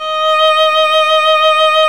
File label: STR VL D#6, Chamber; Roland L-CD702; Combos 4; Chamber Grp2